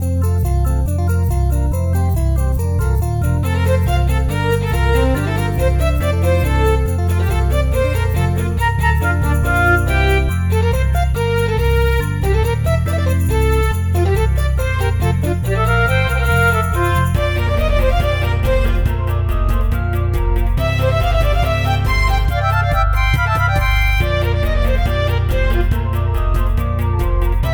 Sleigh Bells Fast; Newer Super Mario Bros Wii

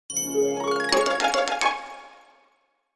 happy jingle

